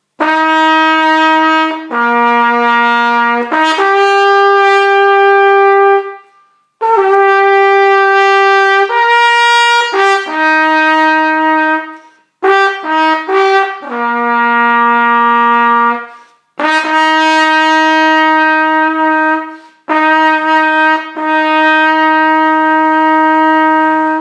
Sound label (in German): Fanfare kurz